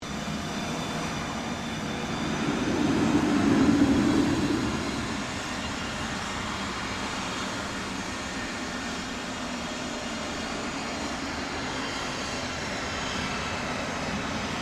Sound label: 2005 CESSNA CITATION CJ3 IDLE; Airplane Sound Effects; Airplanes (Sound Effects:Vehicles)